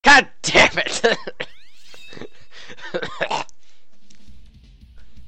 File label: gurgle